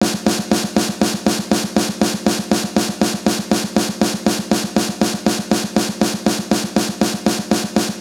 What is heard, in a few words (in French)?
déséquilibre sonore, batteur